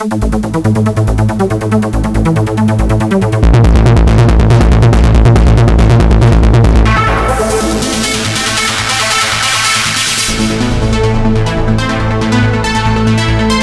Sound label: ARP; FUGITIVUS